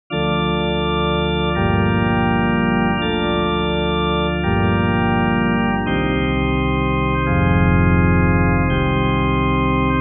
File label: Pipe Organ